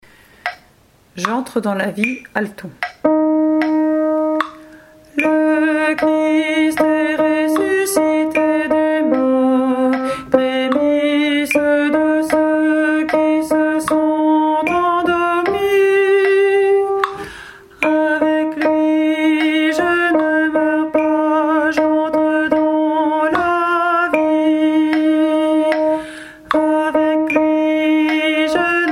ALTO

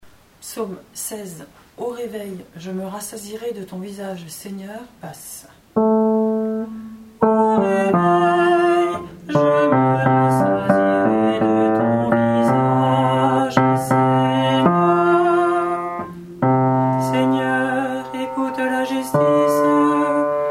Psaume BASSE; 16 Au réveil Je me rassasierai de ton visage Seigneur

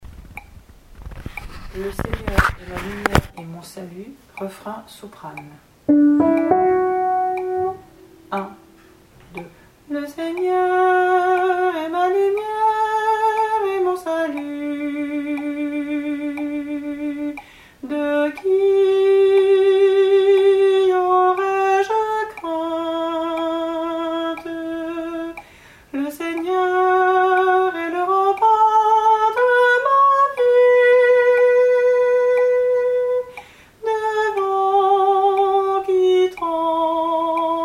Le Seigneur est ma lumière et mon salut REFRAIN SOPRANE

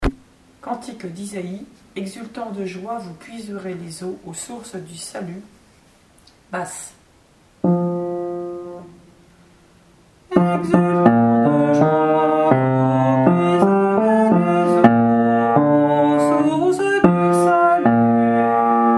cantique d'Isaïe Exultant de Joie BASSE

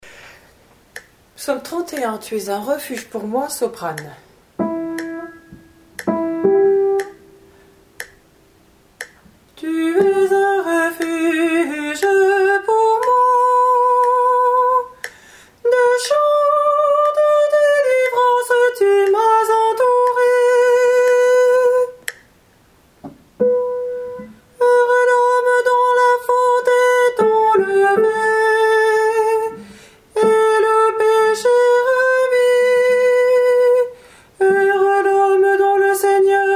Soprano; Ps-031 Tu es un refuge pour moi; Gouzes

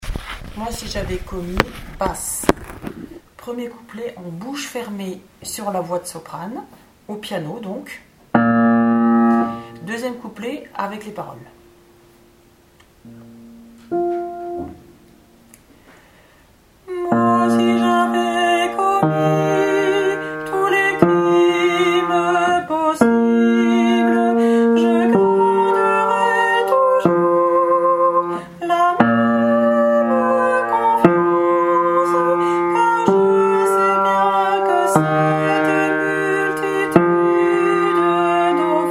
SOPRANE BASSE avec imperfection; Moi si javais commis offertoire communion

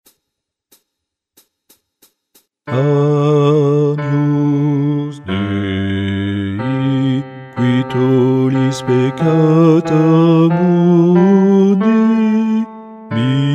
Messe de Saint Jean Agnus Guide Chant Basse

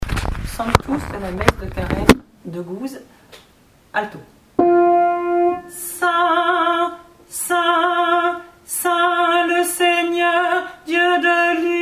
ALTO; Sanctus Messe de carême

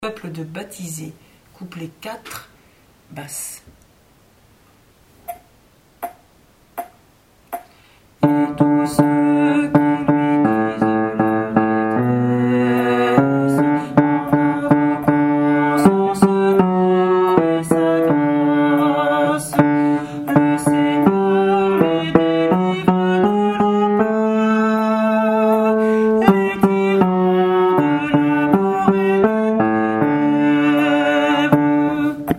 COUPLET BASSE; Peuple de baptisés

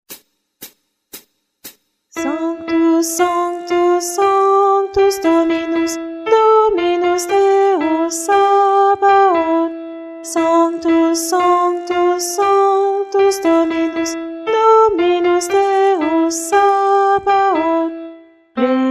Messe de St Paul Sanctus Guide chant Alti

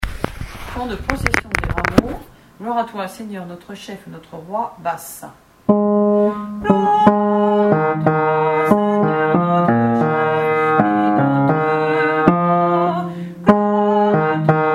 Gloire à toi notre chef et notre Roi Basse, chorale paroissiale; semaine sainte

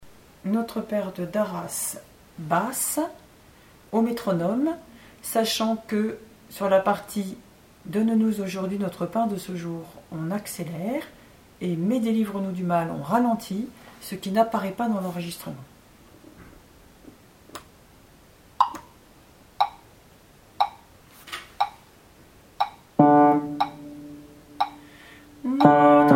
BASSE; Notre Père de Darasse

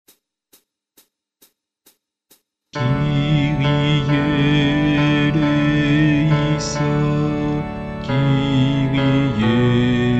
Messe de St Boniface Kyrie Guide Chant Basse

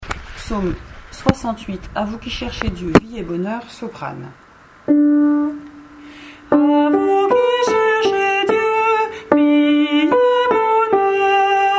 Soprano; À vous qui cherchez Dieu Psaume 068 Kruczek

